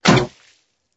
Hit Enemy; bubble-guppies; bubble-scrubbies

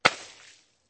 Tissue Hit; bubble-guppies; bubble-scrubbies

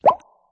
Tissue Collect; bubble-guppies; bubble-scrubbies

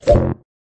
Hit Friendly; bubble-guppies; bubble-scrubbies

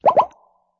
Double Tissue Collect; bubble-guppies; bubble-scrubbies

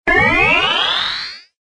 zap; disney-junior; mickey-s-super-adventure

